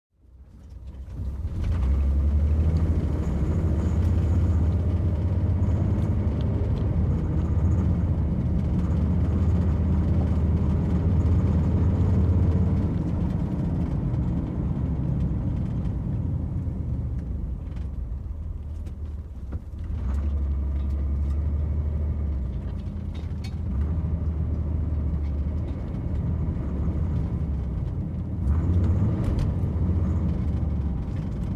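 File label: Corvair Drives Slowly, chevy corvair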